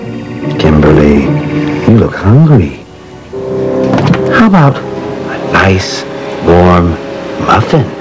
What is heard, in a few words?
sob muff